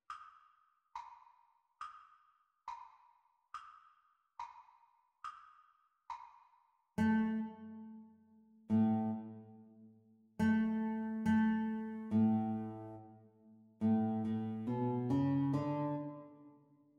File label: Guitar Duet Playalong Practice Speed